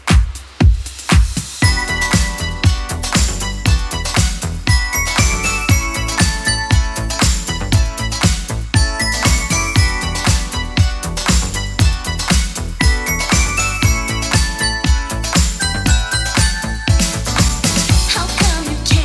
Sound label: 80s Club Edit 118bpm